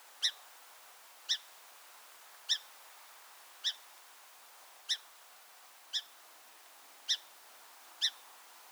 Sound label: Song Sparrow Melospiza melodia